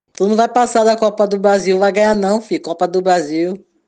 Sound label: haha